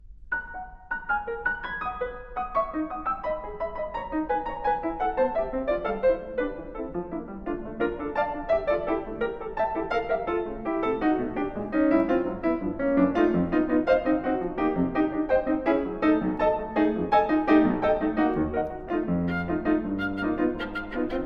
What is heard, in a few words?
Trio for Clarinet, Viola, and Piano - Presto; Bruch Trio